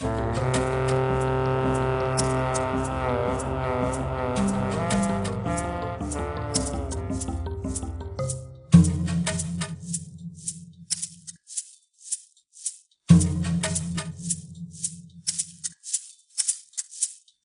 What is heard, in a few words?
Jungle Level